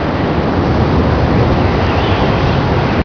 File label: wind whistle; nature; hl2